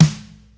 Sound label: verby steel snare drum d sharp key